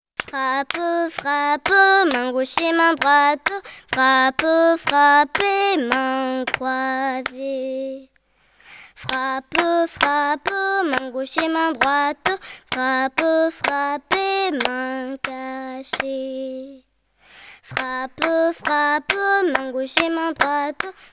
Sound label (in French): frappe frappe chant